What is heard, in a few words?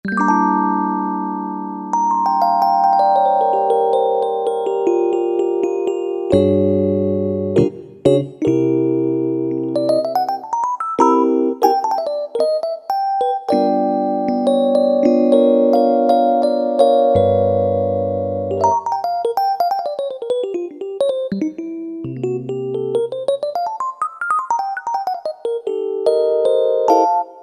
E. Piano, Roland SC-50 Sound Canvas; Factory sounds